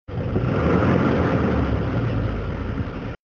engine rev

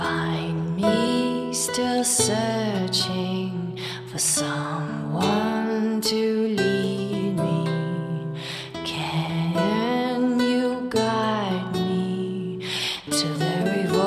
Tavern Song

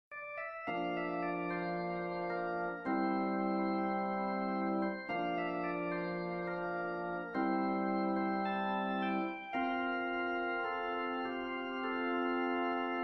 melodic music

